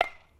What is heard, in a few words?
wood stick